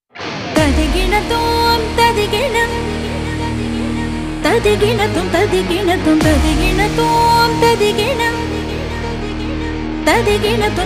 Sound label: Devotional Ringtone